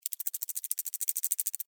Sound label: Spider Walk Move, Animal, Spider, Walk, Movement, Arachnid, Insect, Bug; Spiders; BlastWave